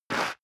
step leather shoes concrete grit slide short; schritte

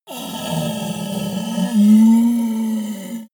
yeti groan pain weak; kreaturen

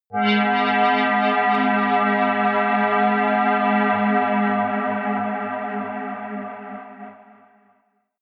atmospheric waves, drones

